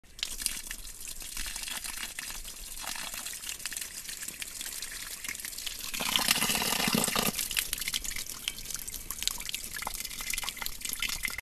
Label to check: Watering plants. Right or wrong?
Right